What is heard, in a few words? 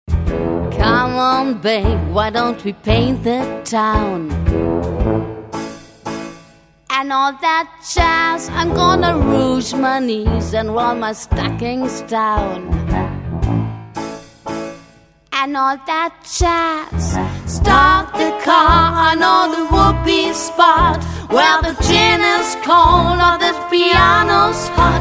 Jazz